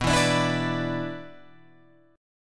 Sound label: B-Minor Double Flat 5th, Guitar Open G